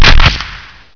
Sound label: rifle